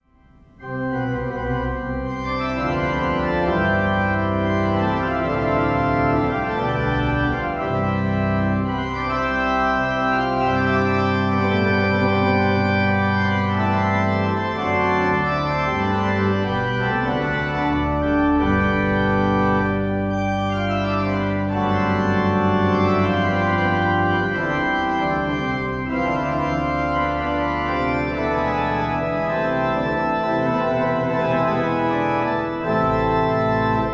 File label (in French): Plain Jeu II 5me Ton